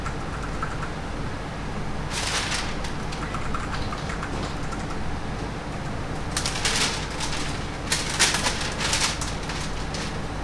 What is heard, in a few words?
ROOM, ROOM NOISE; 1000fx